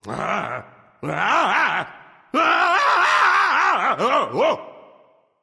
nv fear, vox; smite; voicepack; vulcan

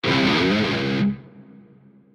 spin guitar; smite; chaac

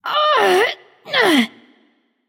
grunt lift light; smite; voicepack; hel

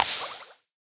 poison hit; smite; raijin

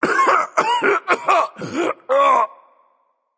clear throat, voicepack; smite; jorm

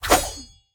scorpion attack spear; smite; npc

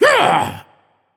grunt attack, voicepack; smite; jorm

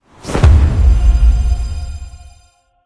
angelic level up; smite; thanatos

